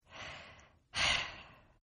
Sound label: Sigh Female; Exhaling; Voice; Partners In Rhyme